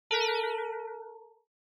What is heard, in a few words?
Negative Timbre; Onoma